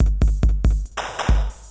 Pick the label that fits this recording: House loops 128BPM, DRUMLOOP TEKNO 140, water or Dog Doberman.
DRUMLOOP TEKNO 140